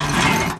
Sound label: metal run, Footsteps; Thalassophobia Restored